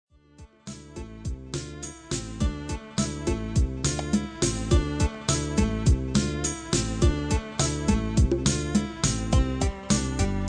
Music Menu; Fish Fillets Next Generation

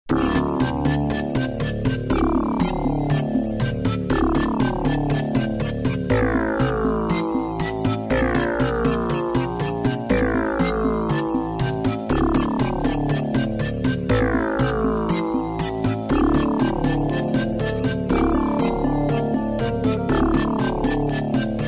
music Ominous; Jill of the Jungle

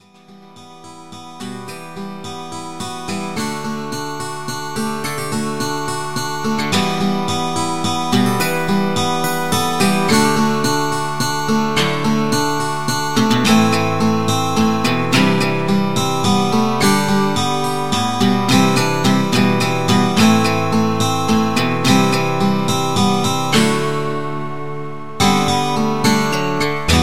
unplugged

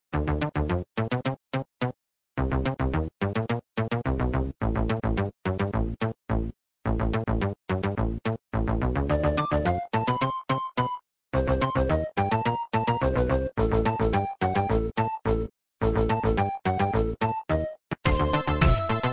InGame BGM; Dyna Blaster

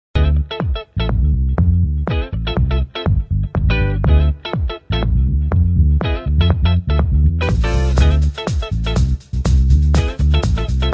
Main Menu Music; Midtown Madness

